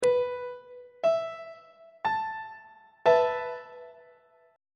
d double sharp suspended 4th 2nd inversion on treble clef